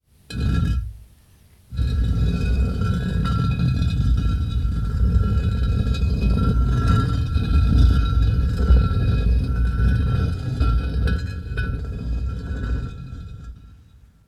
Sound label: stone dragging